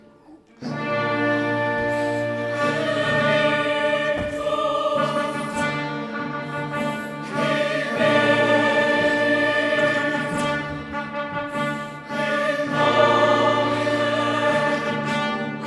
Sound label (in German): Benedictus Trompeten; Nelsonmesse; Haydn